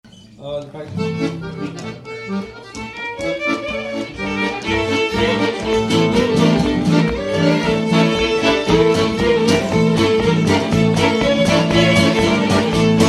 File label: Klezmer Bulgar in A; Field Recordings; memorial cds